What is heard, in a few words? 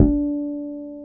P-BASS D2; Sonic Reality - Vintage Timetraveler